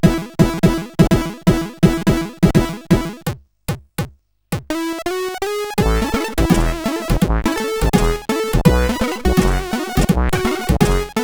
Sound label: Happy Birthday sid CSG8580R5